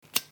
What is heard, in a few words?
lockpick hit; hijack